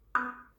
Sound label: windows xp ding